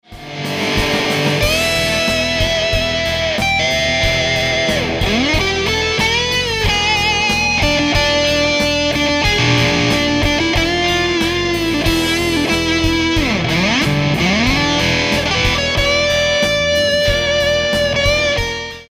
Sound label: solo; take a breath